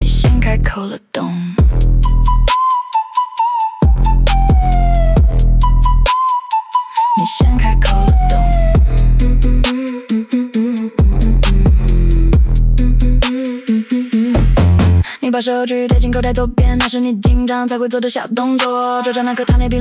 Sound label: hook mixed